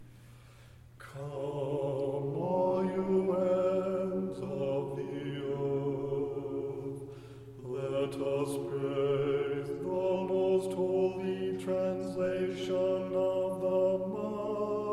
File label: Kievan Sticheron Example Bass; Tone3